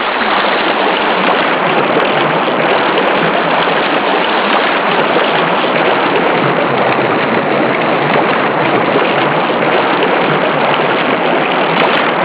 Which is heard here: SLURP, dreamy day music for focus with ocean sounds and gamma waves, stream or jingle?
stream